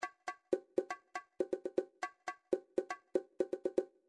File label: individual drums bongos, midi